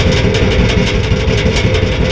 vent; vendetta

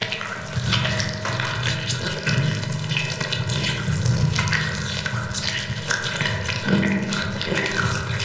slasher; vendetta

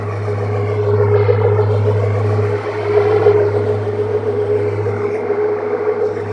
machinery; vendetta

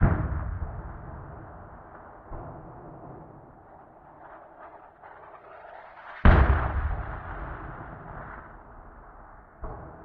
outdoor battle inside; st mission

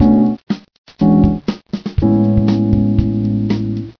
jazz

